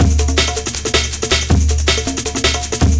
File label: Cool Break, Jungle Breaks; Blu Mar Ten - Jungle Jungle - 1989 to 1999 Samplepack